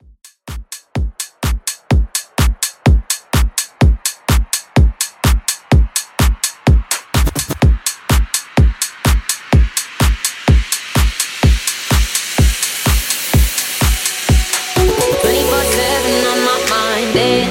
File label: Disco Club Extended Mix 125 Bpm